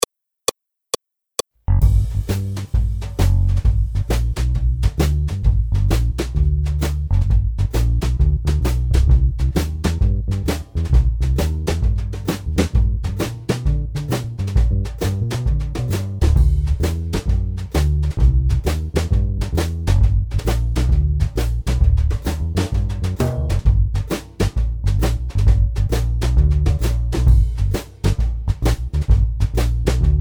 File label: boogie Accompagnement seul, piano